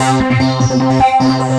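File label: loop synth, 150 BPM, drum & bass; Ueberschall; Drum & Bass Resonance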